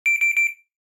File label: Appliance button press tone; Home and Household